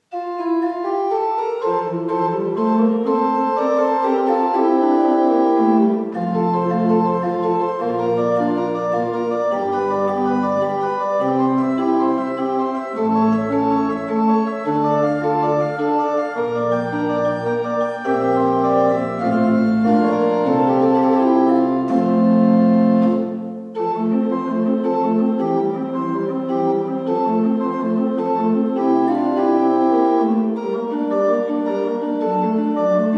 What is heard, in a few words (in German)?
Praeludium in F